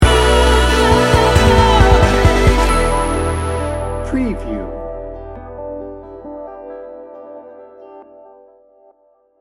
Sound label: soulful dreamer sting bumper